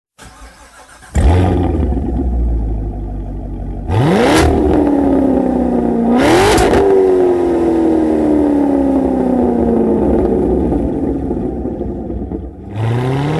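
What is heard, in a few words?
Slip On Corvette Stingray C7, exhaust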